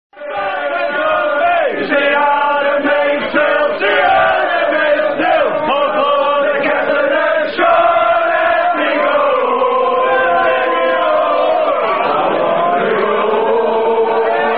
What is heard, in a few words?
Rugby Sing-A-Long; Sloop John B (I Want To Go Home)